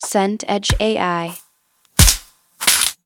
footsteps concrete

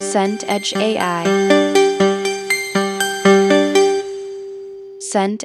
synth arp loop

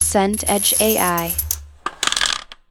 skateboard roll ollie

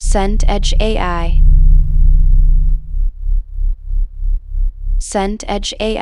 engine room

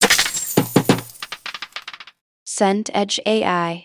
impact debris

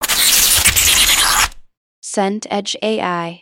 page wipe

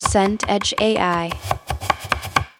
chop vegetable fast

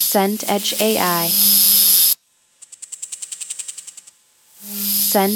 fly buzz

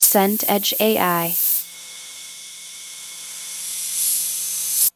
hiss bed

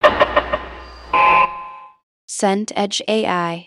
train door beep

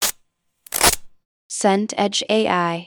velcro rip

